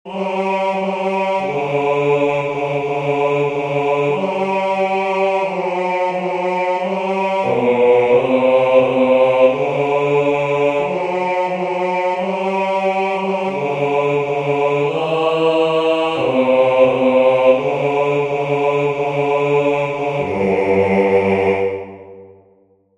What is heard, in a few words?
Bass